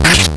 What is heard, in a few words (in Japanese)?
ヒット